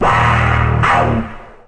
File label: evil note; Weird and Interesting Hits; single hits